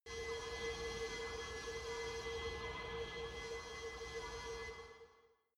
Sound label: space alien fabric machine quiet